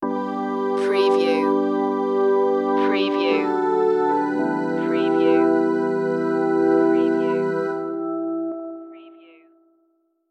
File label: sun in your face stinger